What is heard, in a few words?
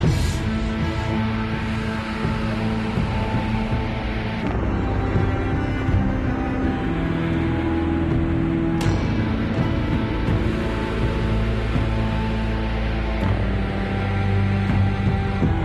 Loop Emotional Tense